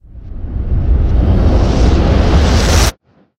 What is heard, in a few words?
magical whoosh reverse; soundscrate